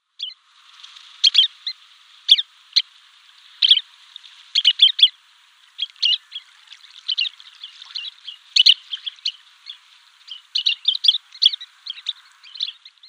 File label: Sanderling calls